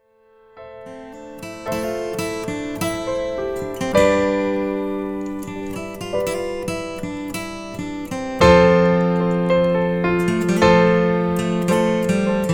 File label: soundscapes